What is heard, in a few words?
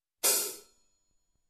Open Hi Hat